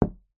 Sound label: sport pool ball set down on pool table